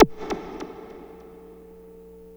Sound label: WALL MOOG, Synthy Tonal; Distored Reality